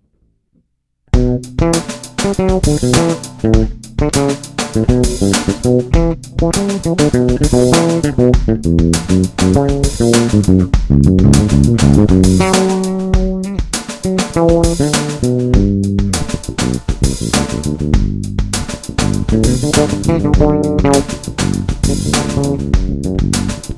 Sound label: bass spain funk